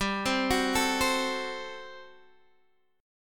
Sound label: G Major 7th Suspended 2nd Suspended 4th G down Guitar Standard; GM7sus2sus4 arpeggiated